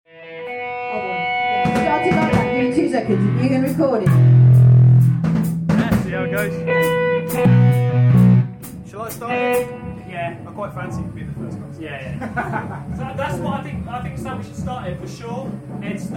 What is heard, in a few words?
shatter effect jamming